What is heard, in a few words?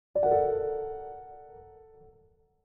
level